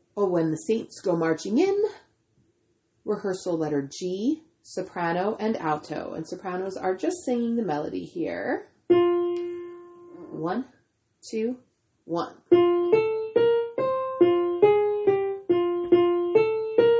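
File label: soprano alto; when the saints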